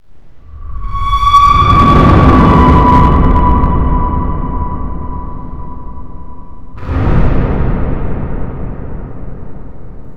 ghost wind; Creepy Sounds

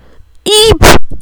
eep

